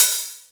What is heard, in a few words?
HAT, Hip Hop; HipHop Kick Snr Hat; PlatinumPhattCD1; E-MU Producer Series Vol. 8 – Platinum Phatt